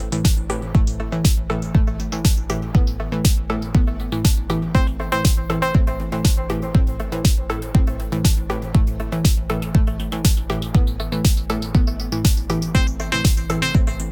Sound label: Dancing Music Loop